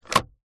vehicles car hyundai trajet 12v power socket compartment drawer open; 2006; zapsplat